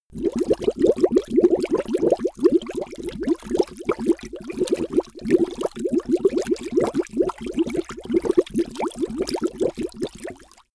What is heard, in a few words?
bubbling